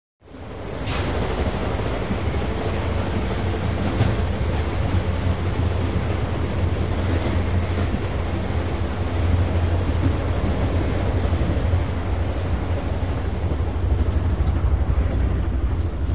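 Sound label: Amtrak Train Interior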